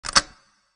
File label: Unlock; Crossy Road; Plain